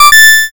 correct

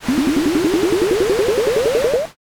pour

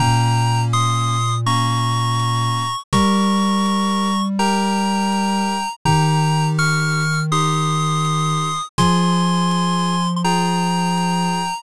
bgm mellow

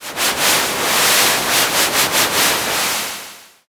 river

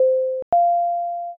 intercom

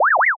scratch

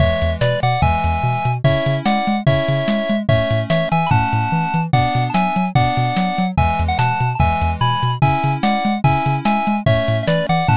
bgm street

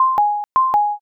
cuckoo

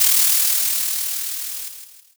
sparkler

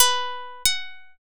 sitar

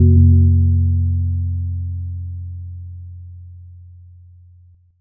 gong

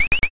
mouse

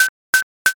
scissors

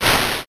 swoosh

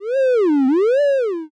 metal strain

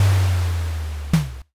drawbridge

